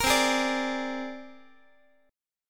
C 7th Suspended 2nd Sharp 5th C down Guitar; C7sus2#5 strummed; JGuitar

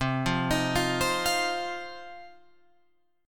C Minor Double Flat 5th, Guitar, Open F; arpeggiated; 12,12,15,12,15,12; JGuitar

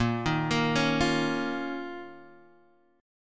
D sharp 7th Suspended 2nd A sharp, Guitar

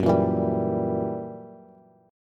Gb 7th Flat 9th Gb Bass; strummed; JGuitar

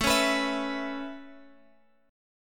A Major Add 9th A Guitar Open G, chord

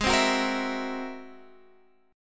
Ab Augmented 9th Ab Guitar Standard; strummed; Ab+9; JGuitar

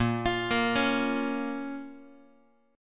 Bb Minor, Guitar; arpeggiated; 4A,4E,5A,5D,5G#,6C#; chord shape; x,6,8,8,5,x

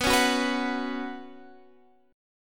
B Suspended 2nd, Guitar, Half Step Down

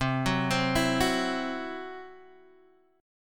C-Major Flat 5th, Guitar Open D

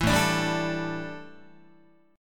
A 7th Suspended 4th, A, down, Guitar

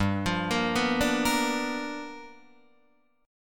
G 7th Sharp 9th G chord, Guitar Open D

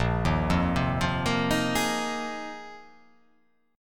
A sharp 7th Suspended 2nd Suspended 4th

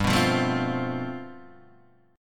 G 11th, Guitar, Standard; G11 strummed

